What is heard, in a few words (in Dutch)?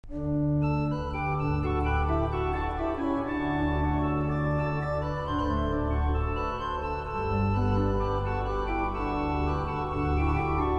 orgel